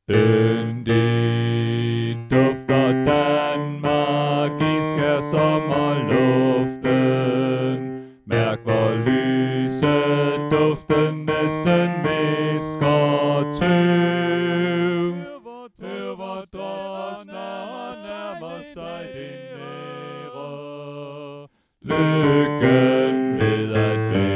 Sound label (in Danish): Bas; Hjulby; oevefiler